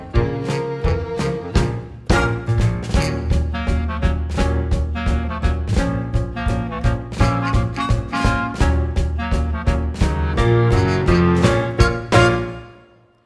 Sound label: Land of Yesterday Accompaniment